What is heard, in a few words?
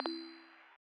menu enter